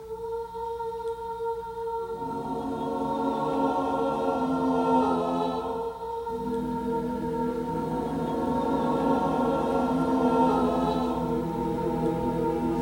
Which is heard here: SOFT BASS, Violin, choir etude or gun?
choir etude